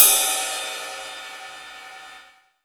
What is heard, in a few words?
ride, cymbal; Ueberschall; Techno Trance Essentials; DRUMS